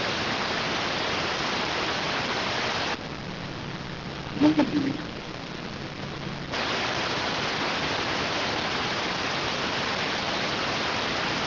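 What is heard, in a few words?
radioteletype receive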